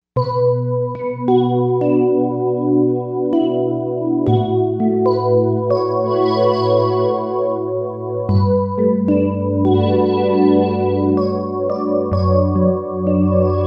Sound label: Wavesweep, Korg M1Rex; Factory Patches